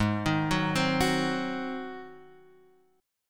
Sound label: G sharp 7th; x,11,13,11,12,13,x,x; down; chord shape